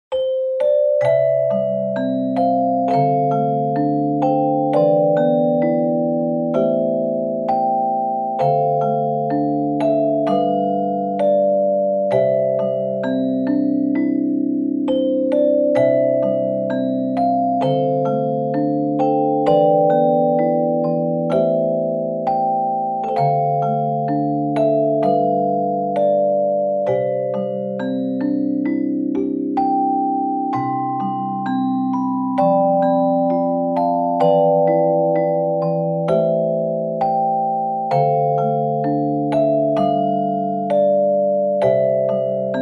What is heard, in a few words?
home sweet home chime